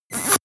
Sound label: air pressure release